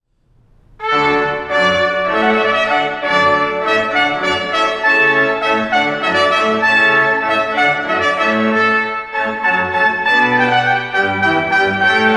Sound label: Hornpipe Organ